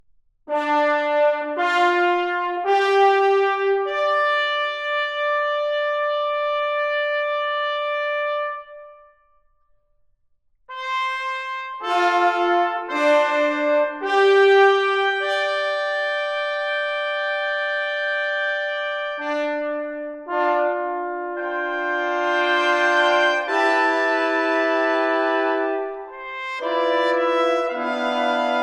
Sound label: Music for Brass Instruments - I. Chorale Fantasy